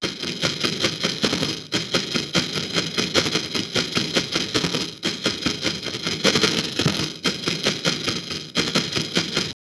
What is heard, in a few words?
a beautiful satisfying an, block breaking